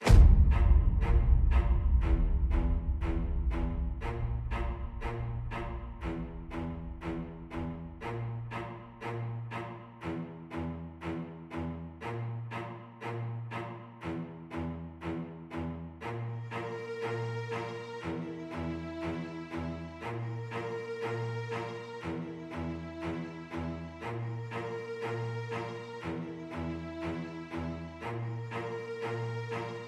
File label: Music, موزیک پس زمینه